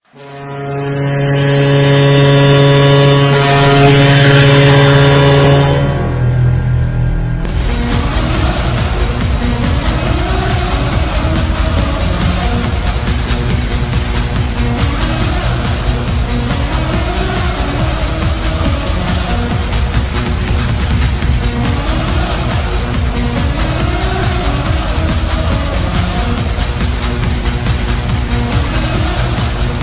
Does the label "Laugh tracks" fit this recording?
No